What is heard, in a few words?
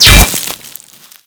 Bolt Impact Stone; Warframe; Cross Bolt